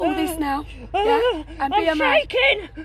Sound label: shaking